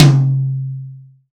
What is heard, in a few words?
high floor tom drum c sharp key